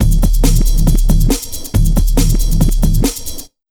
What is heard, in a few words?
Big Beat Drumloops, 138 BT; USB Soundscan vol.25; AKAI; MOVE 138